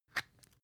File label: floss open compartment soft; haus-garten; badezimmer